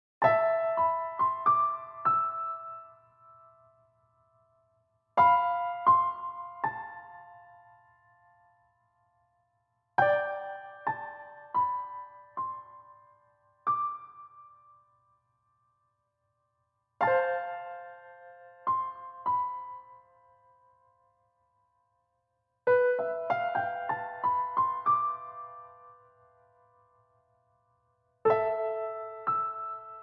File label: Piano